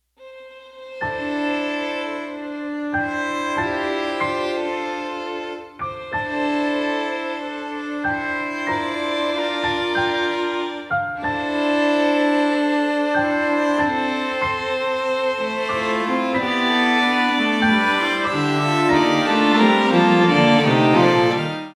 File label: tips for chord progressions